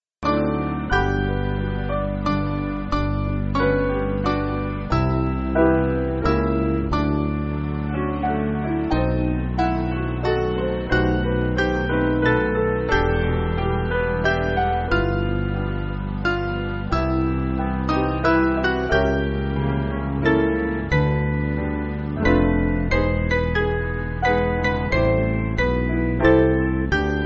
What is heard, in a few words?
Piano